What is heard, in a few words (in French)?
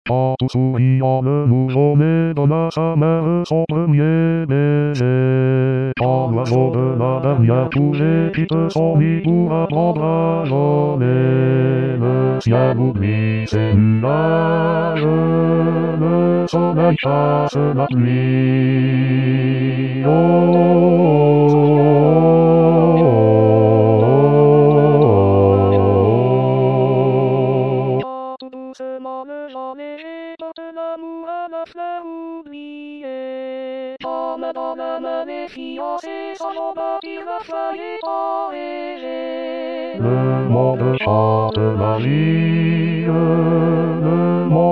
Bas chant des saisons